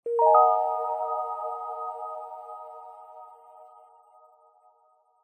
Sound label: multimedia game sound zen game soft warm relaxing positive tone